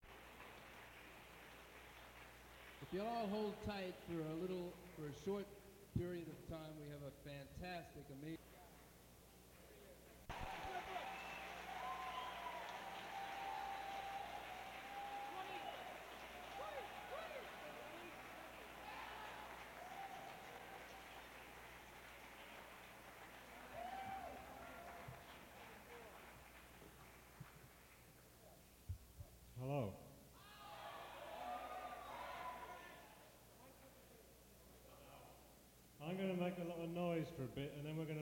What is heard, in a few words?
solo "noise"